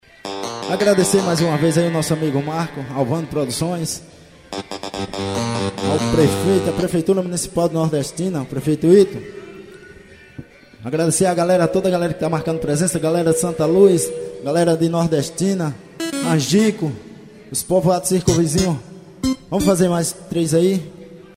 forró ao vivo; pov de pinicadas

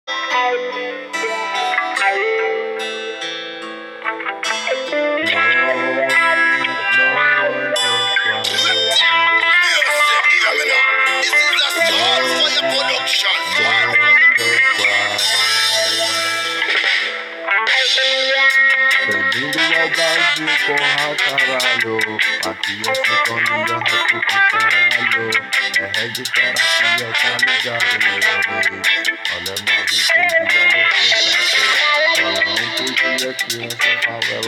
rap

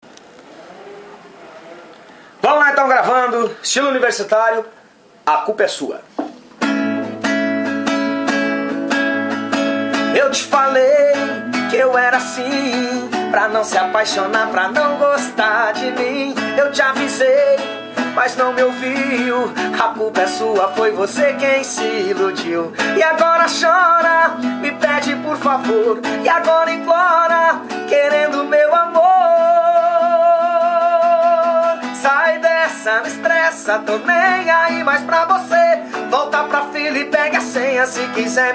ritmo pop rock universitario

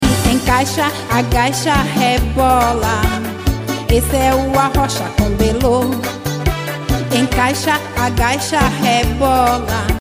arrocha

